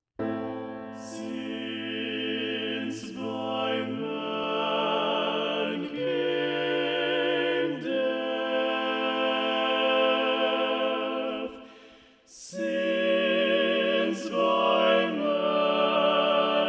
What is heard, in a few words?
Balanced Voices; Since By Man Came Death; Messiah; Handel